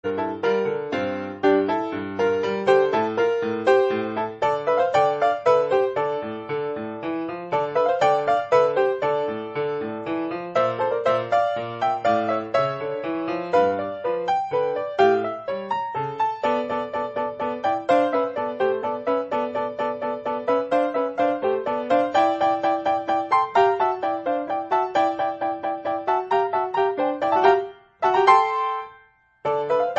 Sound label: grand piano; Mail Order Monsters medley